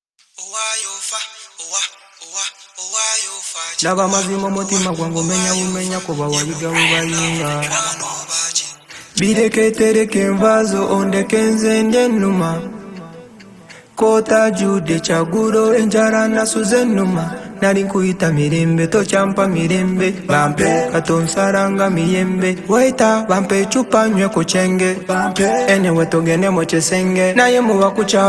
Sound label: Vocals